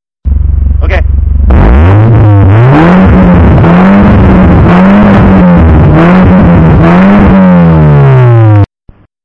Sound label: Accord Exhaust